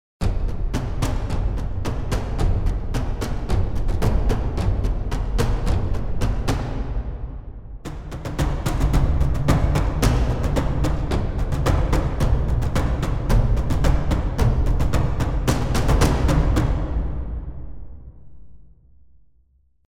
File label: Ensemble; Strikeforce; Felter